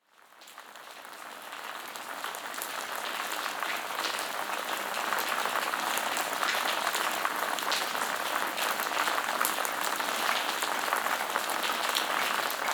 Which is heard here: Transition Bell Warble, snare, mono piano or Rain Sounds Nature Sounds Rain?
Rain Sounds Nature Sounds Rain